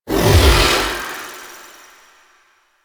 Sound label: Moon Bridge; Ambience; Warframe; Orokin Moon